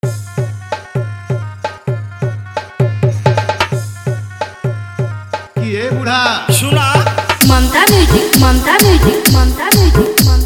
New Viral Insta Bhojpuri Hard Vibration Dj Remix Song